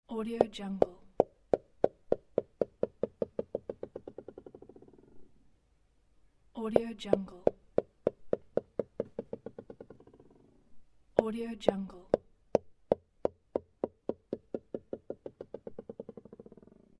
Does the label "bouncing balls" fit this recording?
Yes